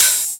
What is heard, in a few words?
Open HH, Cymbals; Vengeance; Essential Clubsounds Vol.4; Open Hihats